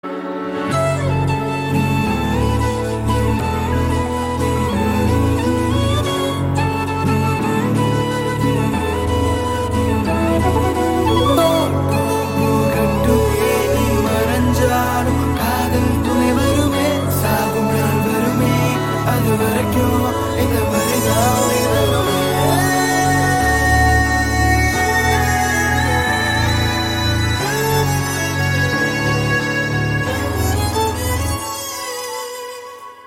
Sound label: Flute BGM